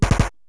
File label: knock